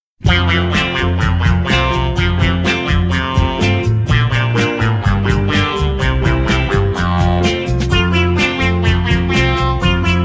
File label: Instrumental; aghany atfal